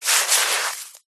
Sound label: wet sand hand dig in; foley; zapsplat